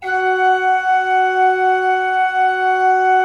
16-8 F4, Cathedral Organ; Propeller Island

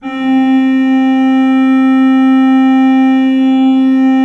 C3, Cathedral Organ; ILIO; Propeller Islands

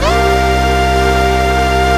MEDUSA C3, LEAD SYNTH; Best Service Alpha Dance vol.2

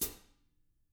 FOOT, Drums

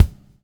BUBL, Backbeat; Spectrasonics - Backbeat

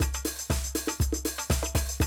Classic Drum Loops; Best Service; XXL 1500 Classic DrumLoops; Sample CDs

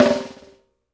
BUZZ, SNARES; Sampleheads; New York City Drumworks VOL-1

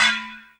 percussion special

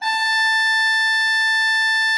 A5, Cathedral Organ, HOLZPRINZ.; Propeller Island

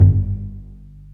Cb Pizzicato; STR; Roland L-CD702; Cb Pizz 1

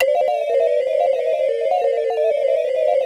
SPACE SNDS; Pro-Rec - Synthsonic; JV FILM

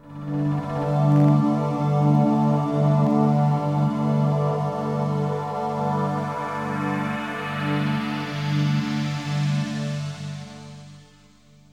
FLOAT D-7, ambient pad

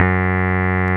Roxichord, E.Piano

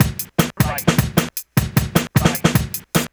drums, Hardcore Breakbeat, 153 Fmin; Big Fish Audio; Hardcore Breakbeat Action

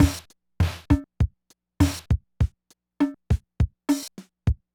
Loop, drumtraks

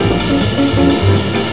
Jazz Jam, Drums & Percussion; Percussion Fills; FX; Sonic Foundry (Sony Creative Software); Futurist Drum & Bass

